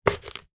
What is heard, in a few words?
chop sound